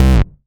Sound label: Bass C, Offbeat Bass